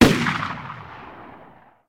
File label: shotgun distant; Project Zomboid v41.73